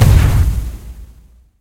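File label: asmd explosion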